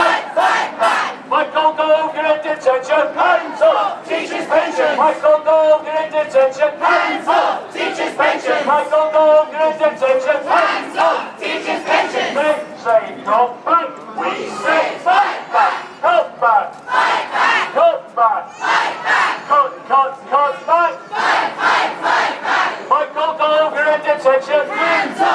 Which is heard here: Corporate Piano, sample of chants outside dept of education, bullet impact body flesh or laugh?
sample of chants outside dept of education